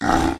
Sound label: hurt